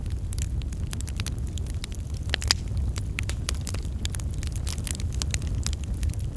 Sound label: campfire